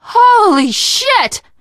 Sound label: violence awe, teen girl, survivor voice; source engine; left 4 dead 2